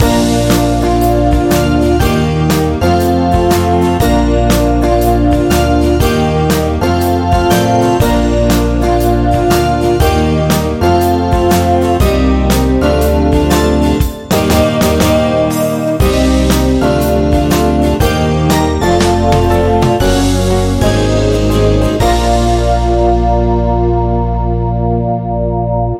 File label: chime